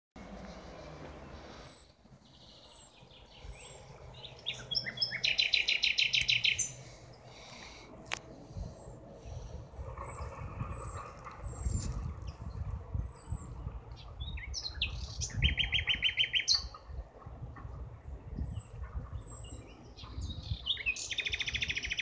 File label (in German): Gesang Nachtigall